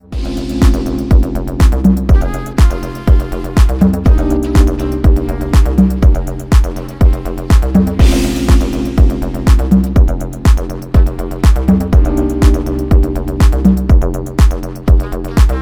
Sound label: Ambient Acid Instrumental